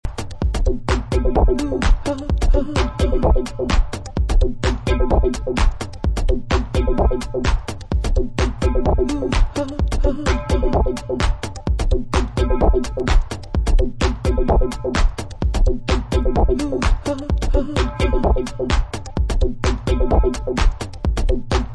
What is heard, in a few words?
idle blues